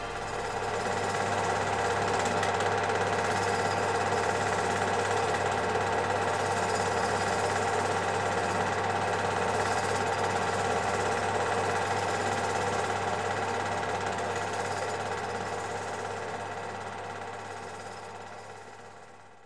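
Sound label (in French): Projecteur Ciné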